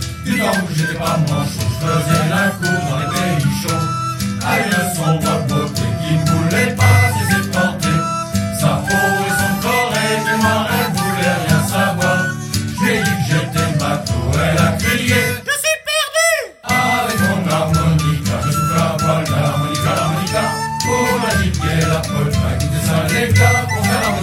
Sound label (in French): avec mon harmonica